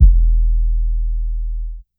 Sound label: Kick Pump It